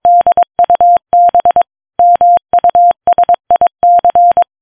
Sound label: Morse Dub Music 140